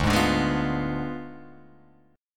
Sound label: D Diminished 7th, D, down, Guitar Open G